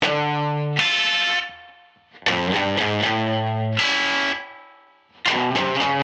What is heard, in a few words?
Guitar after Opto; compressor